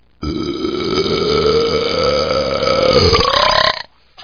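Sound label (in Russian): тошнота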